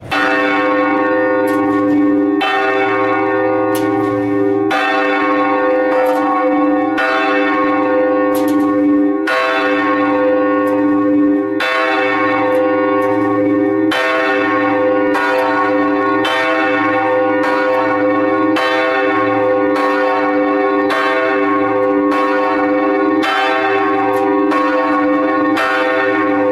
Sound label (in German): Kirche Glocke c1; St Lamberti; Hl Lambertus